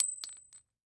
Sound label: Weapon Bullet Shell 40 Dropping On Cement; AdobeCC